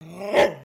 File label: Pets Doberman Hurt; creatures pets